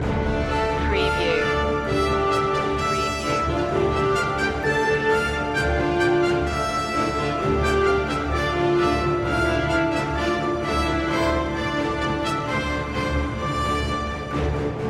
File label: epic medieval tale loop